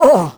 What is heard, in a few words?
squish; Crypt of the NecroDancer